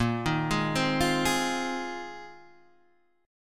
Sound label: A sharp 9th Suspended 4th A sharp, Guitar, Open D